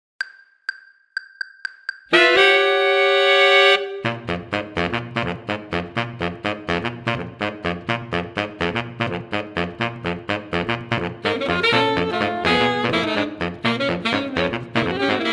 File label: playalong tenor